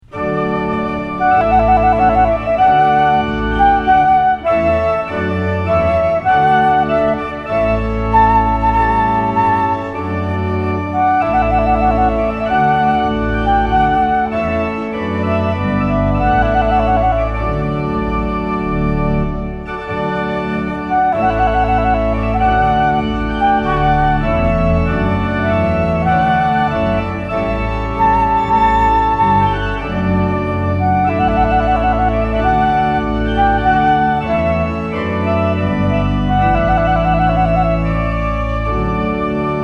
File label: trumpet voluntary